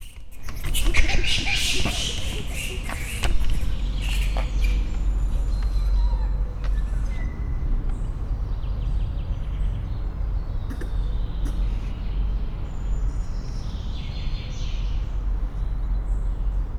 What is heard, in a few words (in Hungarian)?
megriadnak, japánmakákó; szegedi zoo 2011